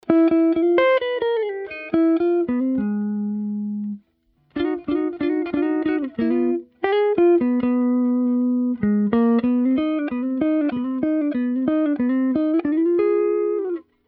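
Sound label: JAZZ GUITAR CLASSIC CHANNEL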